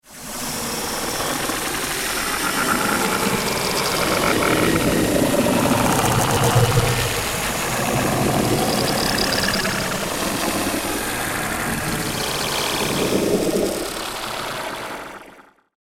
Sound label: Creepy Alien Nest Ambience